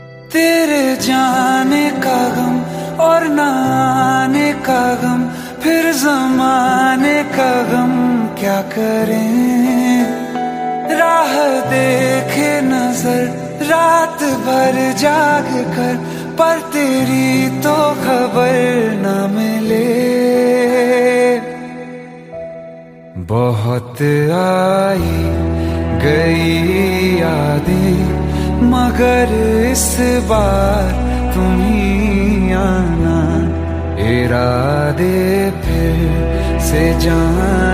Romantic Hindi Song Ringtone

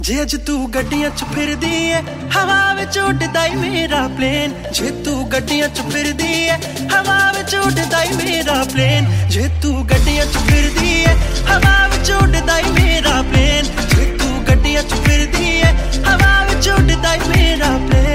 Punjabi Song Ringtone

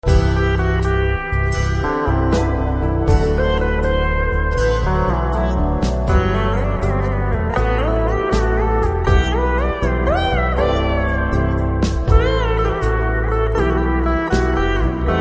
Movie Music Ringtone

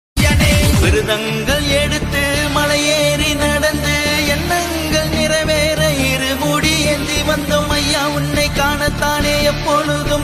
Ayyappan Ringtone Cut Songs Tamil; ringtones

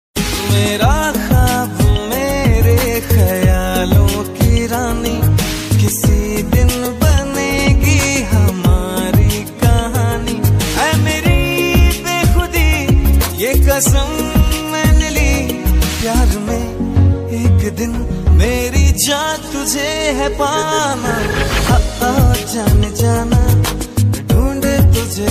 Romantic Hindi Song Ringtone

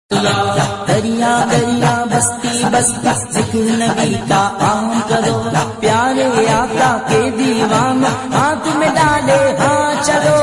Naat Sharif Ringtone

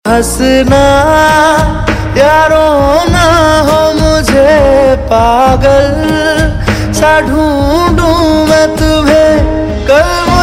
Bollywood Song

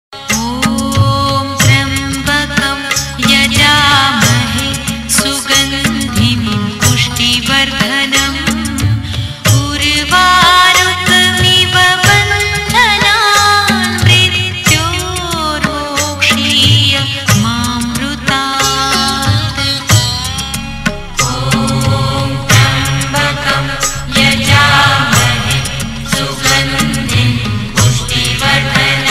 Maha Mrityunjaya Mantra Ringtone In Female Voice; ringtones

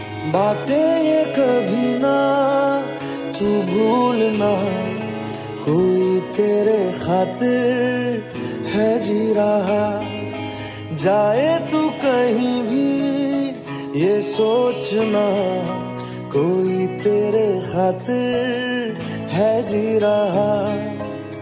Sad Hindi Ringtone

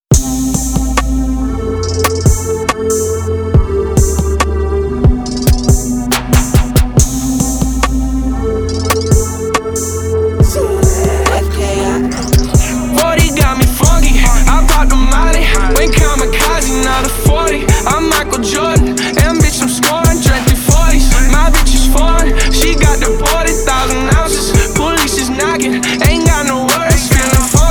Funk

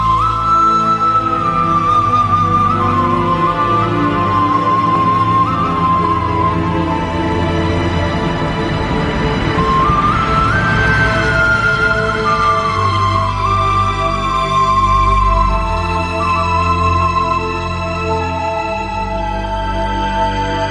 Classical Flute; ringtones

